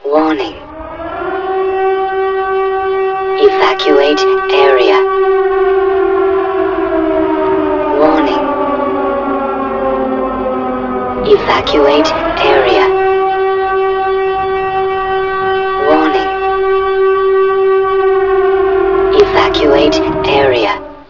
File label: warning